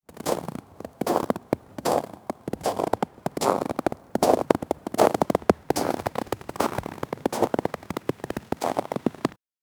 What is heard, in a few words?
sound effect the distinct, winter